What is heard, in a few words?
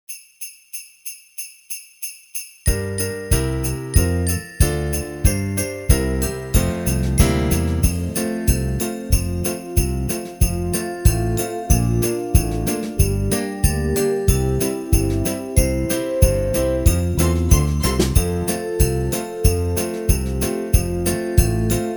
jingle bells karaoke version